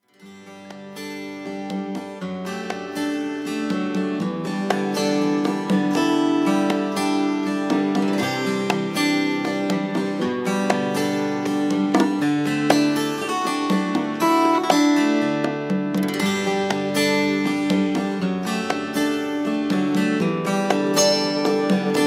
Harbour Huawei

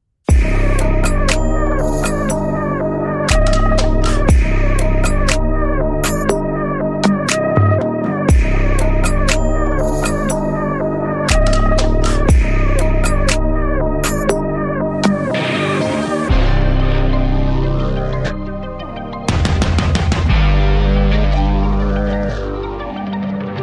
Jazzy

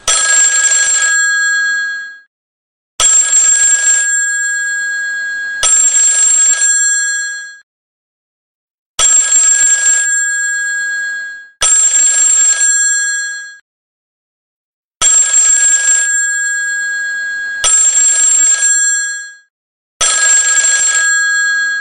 samsung classicstyle

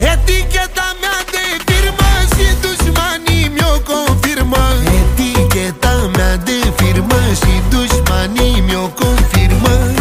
Arabic Style Pop

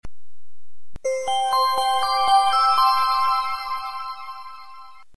bells ring

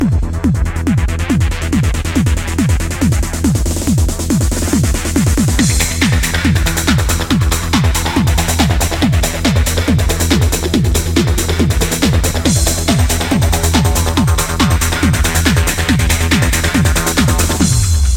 solid electronics

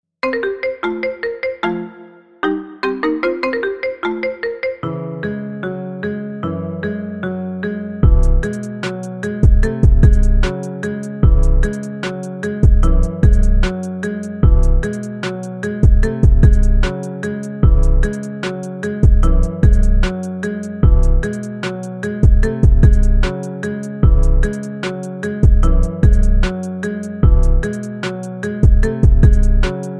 marimba bank account

